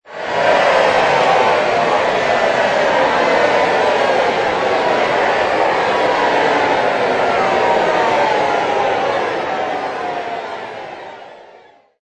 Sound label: Crowd Disagreeing, Medium to large crowd disagreeing, unhappy nay-ing and complaining, protest; Crowds